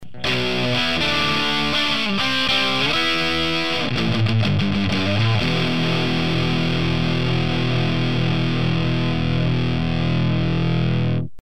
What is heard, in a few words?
seventy lead; Сравнение динамиков от Celestion